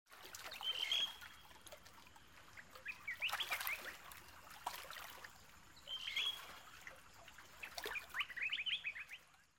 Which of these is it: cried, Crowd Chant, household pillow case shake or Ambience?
Ambience